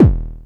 BD clean

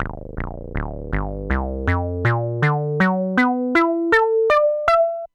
arp

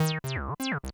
fastsong

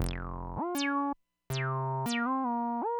slowsong

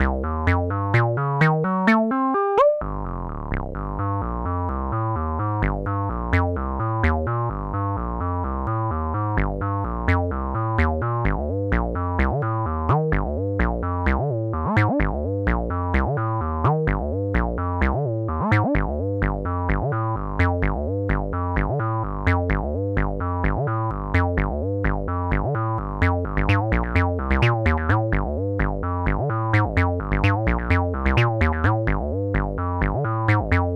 sqr

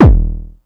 BD led

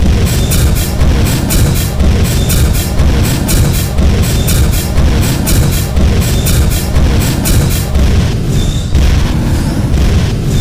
flamethrower song

